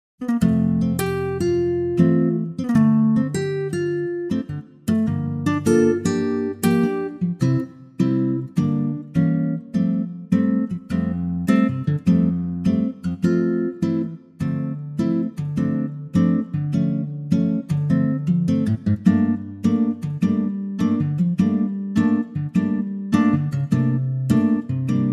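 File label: acoustic guitar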